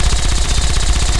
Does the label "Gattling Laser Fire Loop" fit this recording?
Yes